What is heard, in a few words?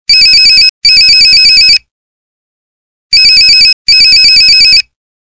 Phone Ring; Coolpad 7296s; ringtones